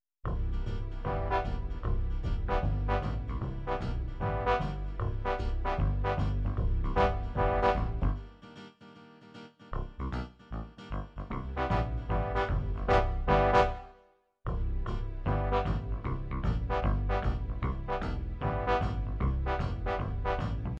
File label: swing, midi